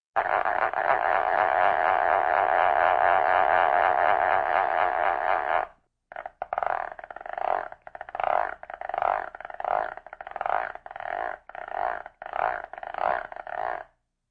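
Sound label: effect of rotation faster and slower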